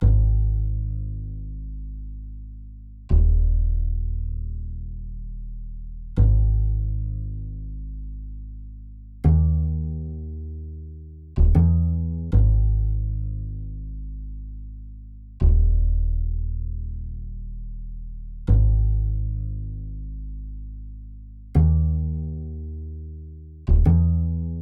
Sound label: 156 BPM G# Min Bass; Cymatics; Quest; Destiny Gold Expansion